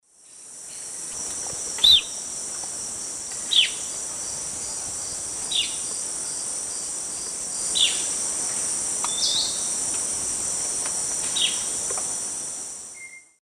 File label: Manacus manacus, call; S. America; Eastern Lowlands